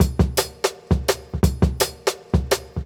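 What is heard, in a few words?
Jungle Breaks, 168 bpm; Original Jungle Breaks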